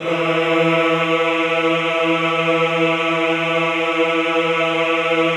EHH E2, Classical Choir; Best Service; Extended Classical Choir; EHH MIX

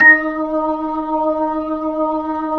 B-3; Jazz; Roland LCDP10 Keys of the 60s and 70s 2

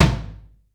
ROOM, ROOM KITS; Crypton - DrumBase5000

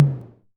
Tom Low, Korg KR-55B

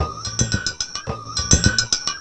CLANG DRUM, rhythm loops; Rarefaction; Poke in The Ear With A Sharp Stick

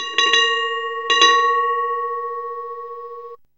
BIKE BELL; Zero-G Ambient 1

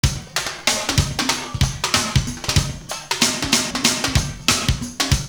STREET PERC, Kitch 70s; Univers Sons

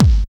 BD, Dance Kit, Roland

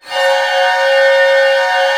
cluster, Orchestra; Sound On Sound - Peter Siedleczek's Orchestra

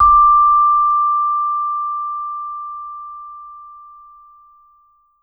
VMED D5, Vibes; Sampleheads - Dave Samuels Marimba & Vibes

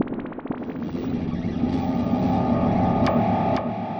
Atmos Sting; musicradar

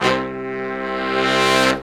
BRS LONG, R&R Horns, Sect; Roland L-CDX-03

